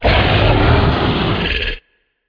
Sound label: dragon roar